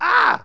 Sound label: AAGH; Warlord3